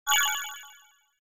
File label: i demand attention, message tones